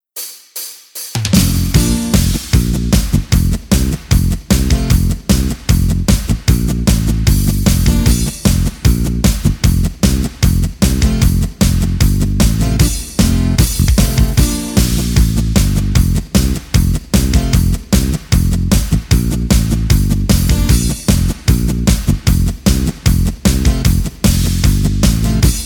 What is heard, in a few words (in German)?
Polka F B ES AS ohne Harmonika Begleitung Hoerprobe